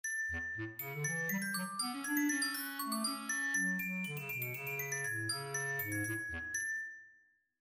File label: inventing free counterpoint; The first task for this project was to create a second melody for two given short melodies